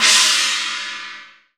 CHINESE PRC, PERCUSSION

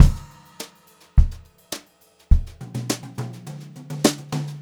104, Brushed Drum Loops; USB Soundscan vol.39; AKAI

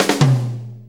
4-4 Fill, Drum Loops

